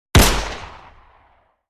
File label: 9mm handgun single shot